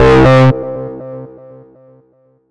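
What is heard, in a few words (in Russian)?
эйсид хаус далекий в пространстве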